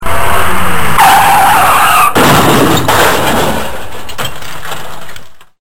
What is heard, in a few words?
crash